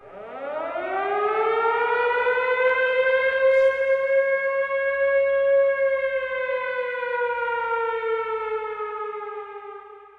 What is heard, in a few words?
air raid